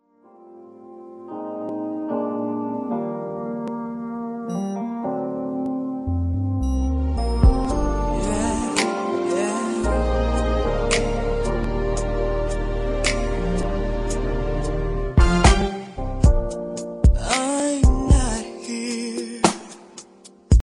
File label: hiphop